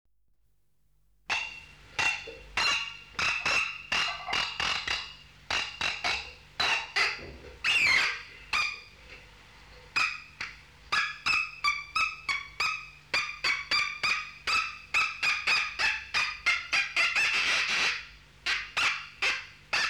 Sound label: polystyrene